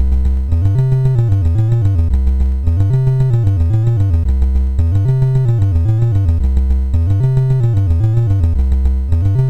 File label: MOS6581R4, sid; Nibbler; hvsc